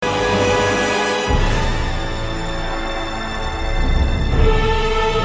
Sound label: Game Tune